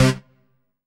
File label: HOUSE, House Dance